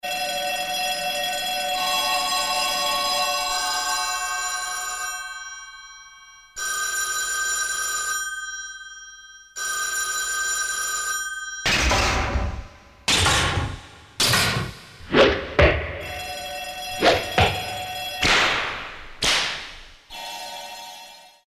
SOUND EFX, Korg DSS-1; Lore, Comb, FX

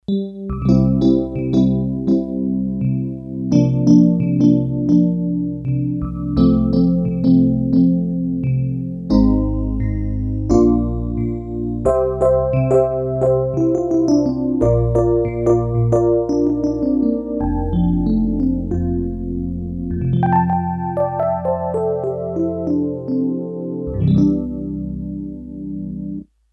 El. Piano, Korg DS-8; Factory Patches

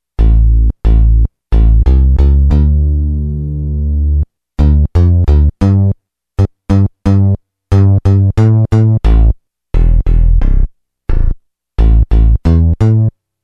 XLarge Warm 15.0 sec

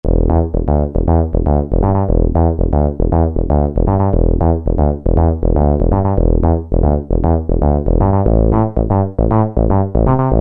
Bass; Cheetah MS6

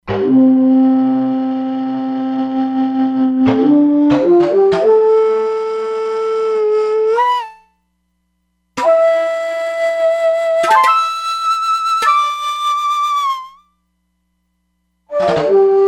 Shakuhachi; Factory patches

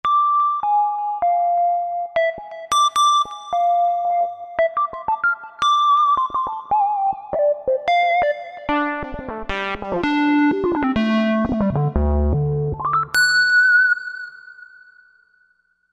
Solo Square, Roland V-Synth; Factory Patches

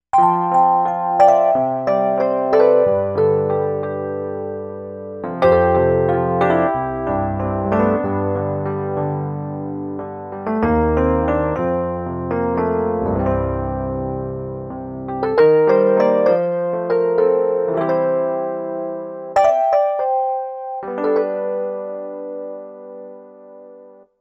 Hard Tines

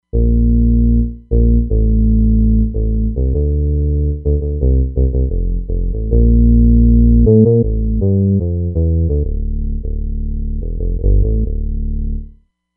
R&B Bass; Roland Fantom XR

